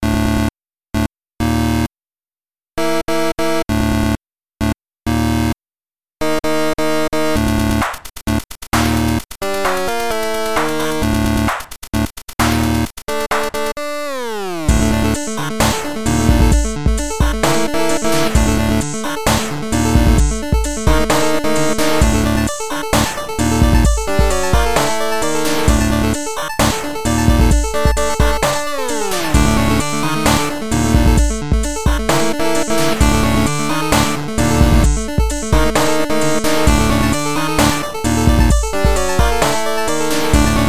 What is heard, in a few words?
Glitchpunk; pony island